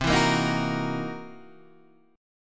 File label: C 7th Sharp 9th Flat 5th C Guitar DADGAD